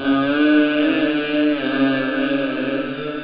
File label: ohh; OctaMED SoundStudio V1